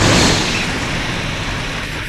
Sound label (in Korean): 증기빼는소리; 音MAD; 少女终末旅行; 소녀 종말 여행 음원팩